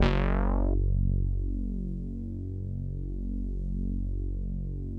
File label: Deep Bass f#1, Korg EX-800